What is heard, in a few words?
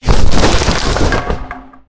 linen; World of Tanks Blitz